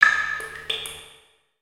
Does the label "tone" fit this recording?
No